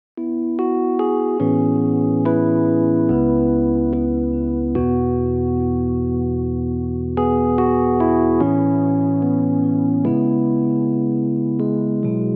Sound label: Rhodes